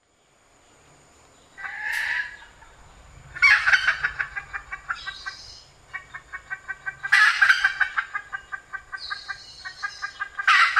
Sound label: red junglefowl